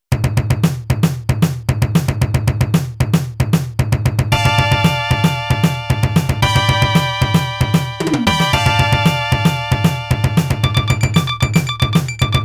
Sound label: INORGANIC BEAT; darius 30th anniversary box